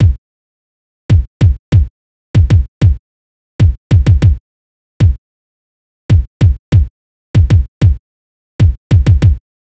Kick, Drum Tracks, Amin; Hip Hop; big fish audio; Hip Hop Exotica; Apple Loops

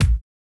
Kick Hit

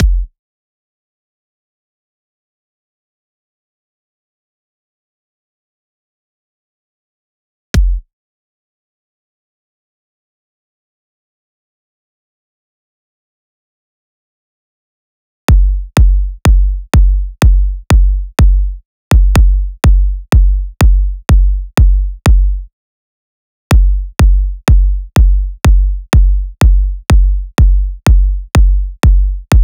Kicks, Future House 124 BPM; Big EDM; Future House Tools; Construction Kits; Power

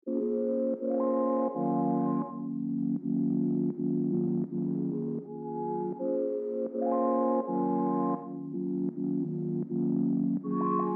81 BPM A# Min Keys; Cymatics; Destiny Gold Expansion; Orchid

